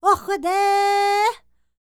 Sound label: Vocal Planet World Voices, NOMADIC